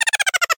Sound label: female mouse laughing